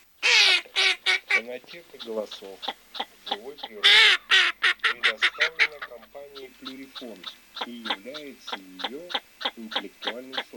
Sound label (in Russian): Широконоска Mestolone; plurifon